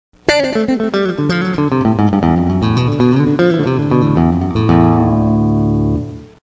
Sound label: drop d slow